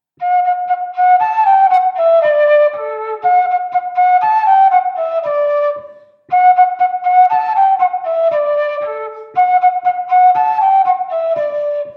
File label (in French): Bourree d'Auvergne